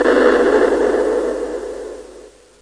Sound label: mid bang, video games